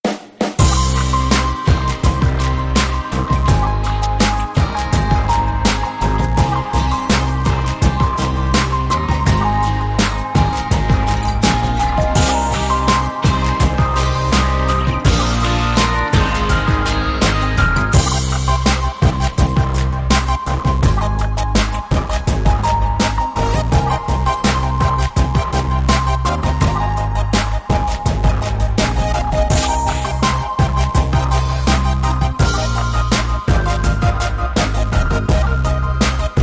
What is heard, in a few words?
Big String Beat, No Strings